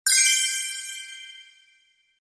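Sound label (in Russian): звук блеск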